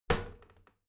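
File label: toilet lid wall open heavy, bathroom; haus-garten; badezimmer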